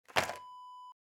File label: candy box set down